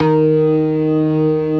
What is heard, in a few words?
CLASSIC C3, Synth and Organ